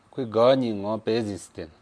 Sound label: laugh